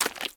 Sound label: Foot Mud Shoes Walk; Lucius III